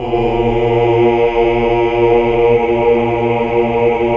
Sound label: HAL AAH. AAAH Uni